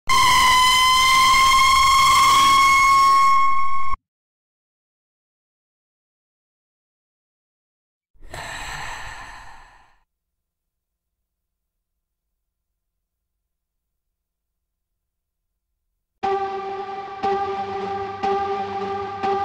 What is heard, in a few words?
scary sound horror effects